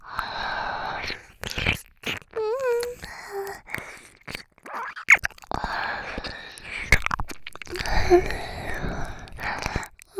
耳舐め喘ぎ; 右差分; お屋敷メイドのハーレム淫語ヘヴン; バイノーラルオナサポ音声

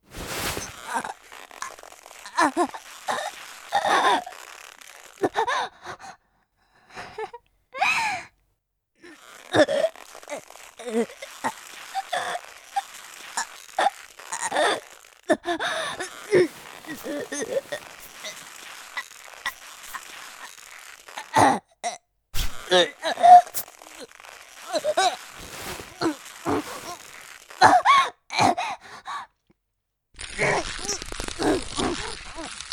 首絞め; おまけ(R-18G)

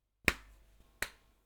叩かれる; 夏実になでなでわしゃわしゃぱんぱんされる音

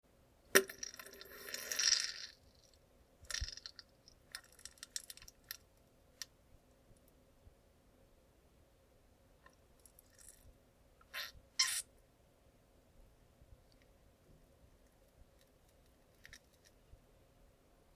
ぷっ ぅぅりゅぅぅ りゅ ぅぅ, ふぇち音 下痢の音

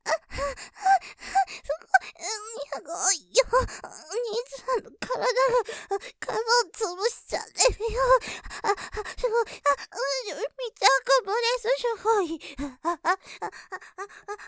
喘ぎ; 寝バック; 密着プレスしゅごい; モノラル; ループで楽しむ！シェフの気まぐれ抜き抜きコース

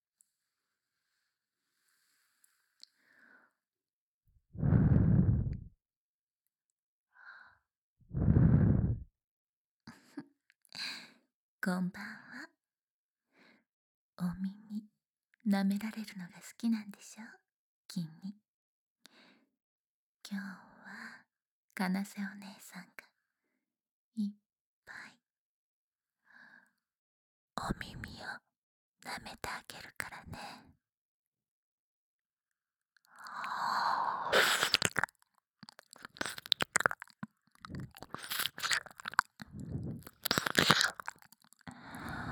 耳舐め（左）, ASMR

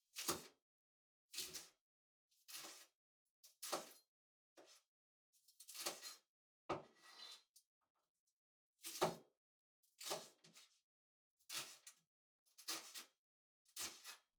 切る ピーマン, 食事に関する音; 3DIO

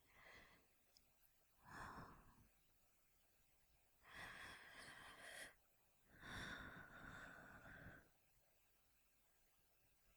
寝息 ループ モノラル

